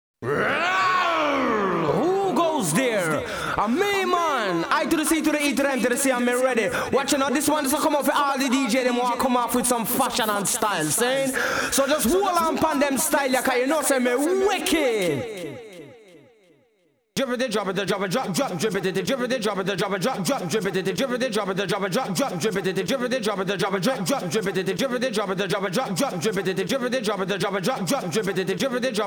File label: (Acappella Slow)